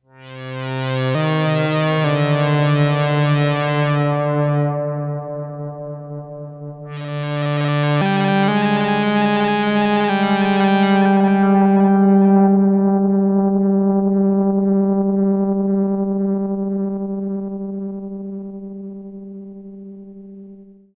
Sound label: matrixbrute2 lead